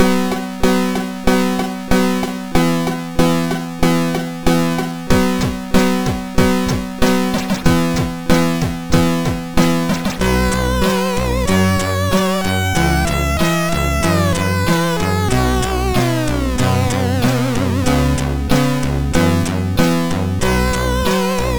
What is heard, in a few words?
Railway, sid MOS6581R4; Salthe Morten